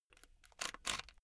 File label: headphone extend unsteady